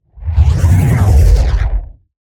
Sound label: Whooshes Impacts Whoosh; soundbits